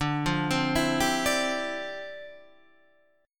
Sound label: D Minor 11th D down Guitar Standard; Dm11 arpeggiated; JGuitar